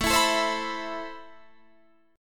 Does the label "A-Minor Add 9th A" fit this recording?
Yes